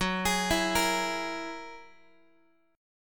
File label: Gb 9th, Guitar; arpeggiated; JGuitar